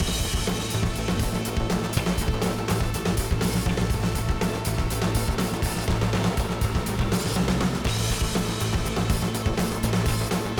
Used Cars Phrase C 122bpm; battlefield hardline; ps3 ps4 windows xbox 360 xbox one

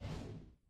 Movement

